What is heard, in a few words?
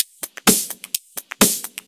Electro Loop 128BPM; Vengeance Samples